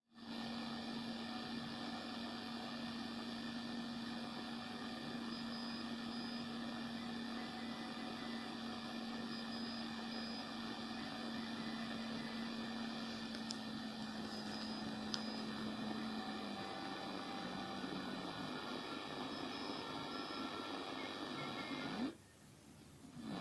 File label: abt 300kHz